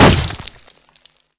blast, Snark; Half-Life

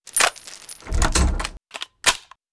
venom reload; rtcw

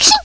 headcrab pain

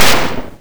hit metal

